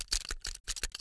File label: deagle special look at; weapons; cstrike; csgo